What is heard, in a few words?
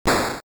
Jack-in-a-Box (Blow Up a Balloon); Hopping Mappy